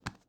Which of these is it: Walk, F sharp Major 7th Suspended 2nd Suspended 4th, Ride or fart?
Walk